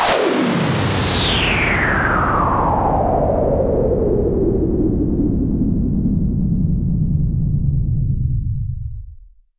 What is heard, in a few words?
FX Sweep Down; vengeance essential club sounds-3